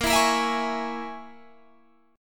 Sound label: Bb Minor 7th Flat 5th down Guitar Standard; Bbm7b5; strummed; JGuitar